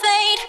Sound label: vocal